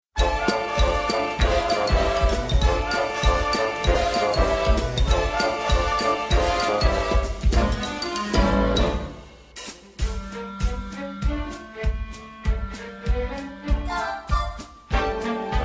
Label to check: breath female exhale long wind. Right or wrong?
Wrong